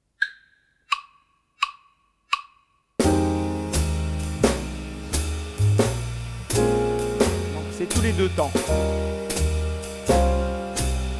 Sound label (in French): accompagnement accents caisse claire; jazz; swing